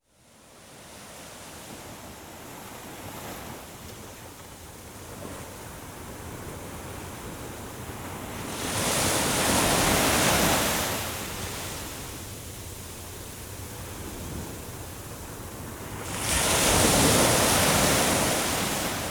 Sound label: Relaxing Music of Adrift and Ocean; Deep Relaxation